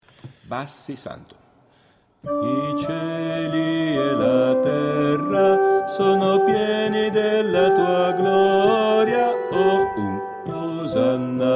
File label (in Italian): Bass Cant Santo; Coro; Santo e bello lodarti